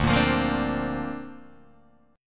D-Minor 7th Sharp 5th D Guitar DADGAD, chord

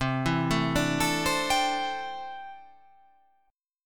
C 6th Add 9th C down

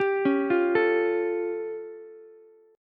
A Augmented 7th A, Ukulele; arpeggiated; JGuitar

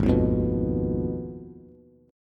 Ab Minor 6th, Ab, Bass; Abm6 strummed; JGuitar

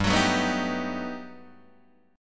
G Augmented Major 9th, Guitar, Open D

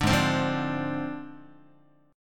Ab 6th Flat 5th Ab, Guitar; strummed

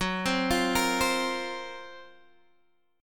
Gb Major 7th Flat 5th, Guitar, Open G; chordshape; down

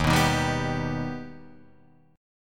D sharp Augmented D sharp, chord

